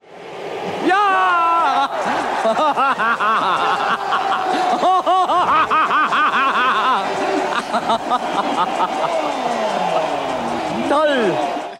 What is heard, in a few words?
Muhahaha